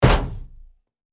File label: hit